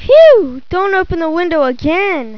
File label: PHEW